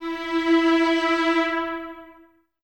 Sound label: String Ensembles, Violas; Miroslav Vitous; LINKS ORCH